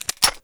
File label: reload